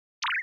High Button Mod Beep. Short High Modulated Button Beep.